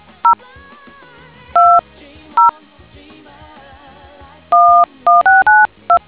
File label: dtmf